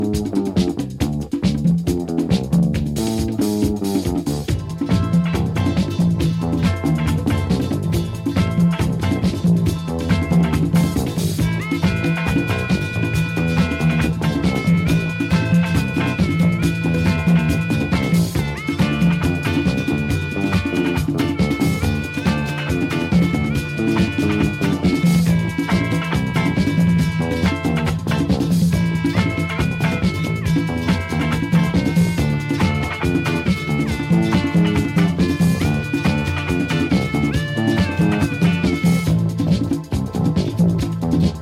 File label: Percussion Gun